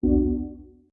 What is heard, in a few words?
Forward Accept